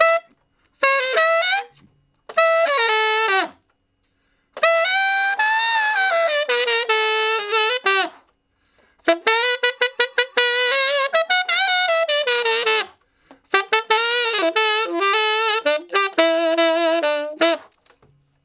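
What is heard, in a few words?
Sax Shuffle (E), Tenor Sax; Solos; Crimson Blue and Fabulous Horncraft 4 RnB; Horncraft for R&B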